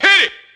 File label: hitit, audioloops